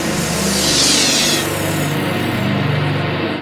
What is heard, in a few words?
D+B